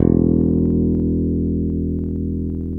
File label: BS1 E1, JAZZ BASS